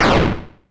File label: DEFEAT; thirtydollarwebsite